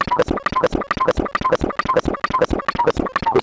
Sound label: Bent Talking Teacher, Circuit Bent